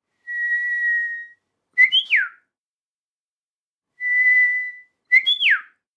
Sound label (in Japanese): hokekyo; 口笛; 映画やドラマなどで良く使われる口笛の定番音源